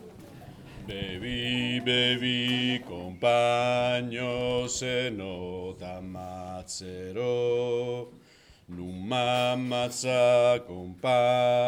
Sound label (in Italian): Bevi bevi compagno basse